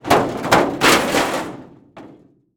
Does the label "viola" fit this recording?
No